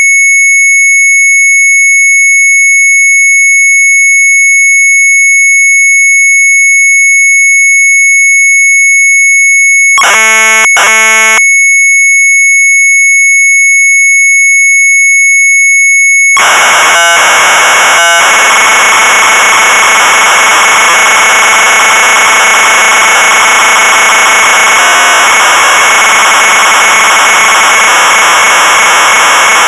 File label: MZ800 Tape; ZEILEN; retromelsarchive; sharp mz800